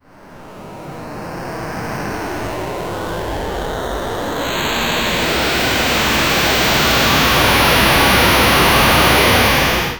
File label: pixel ghost sound attack, gaming